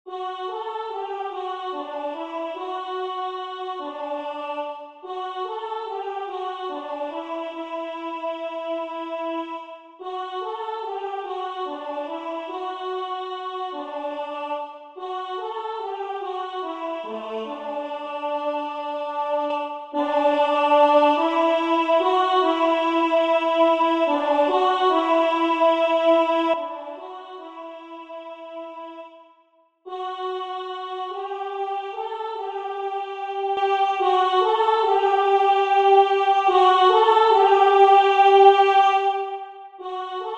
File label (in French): Choeur, trompe; La Reconfortante; Ed Angot; Mayenne